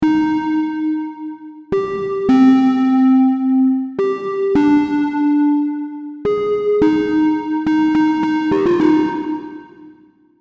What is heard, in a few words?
serum synths; chiqui audio